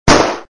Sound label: start Gun; hello kitty